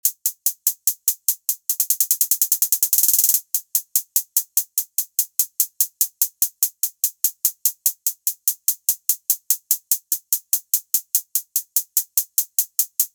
HI HATS

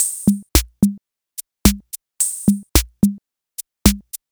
Disco 1 A

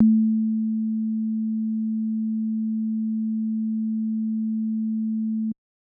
Sine Print

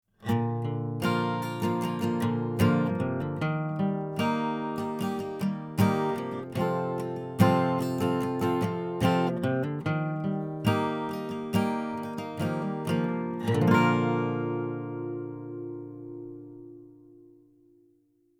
Basic Capo 2

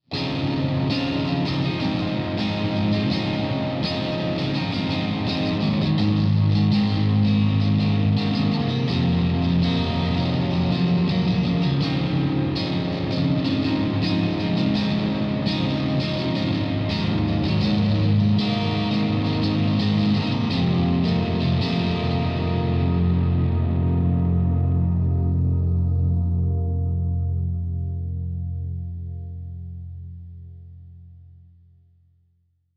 Jazzmaster Ghost Echo; ODR 1

